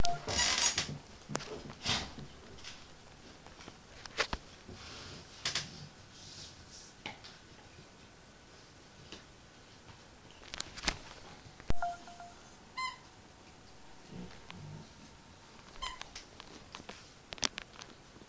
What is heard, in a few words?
squeaky toy